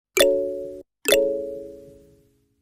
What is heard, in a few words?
Calendar, Nokia Alert tones; Nokia 208